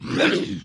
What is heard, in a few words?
injured